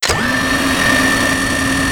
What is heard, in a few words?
gatling wind up; tf2